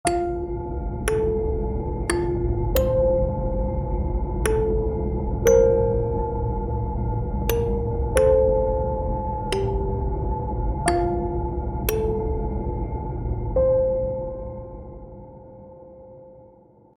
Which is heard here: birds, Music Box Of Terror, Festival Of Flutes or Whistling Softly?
Music Box Of Terror